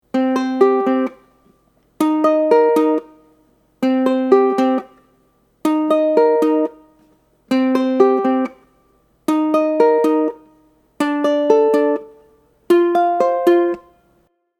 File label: ukulele